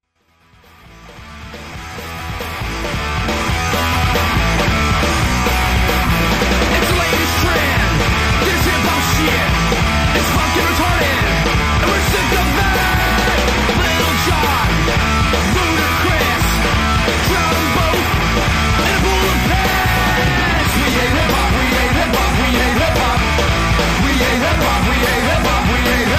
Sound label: Garage rock